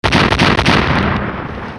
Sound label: bomb